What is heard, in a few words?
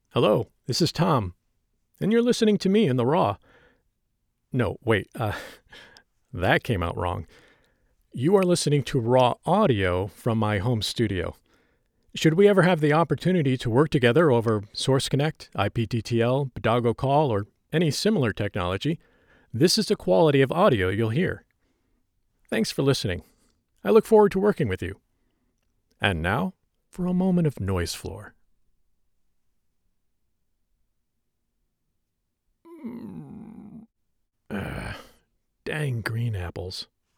Raw Studio Sample